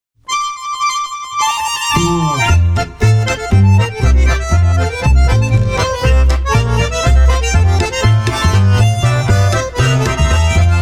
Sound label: swing ma polka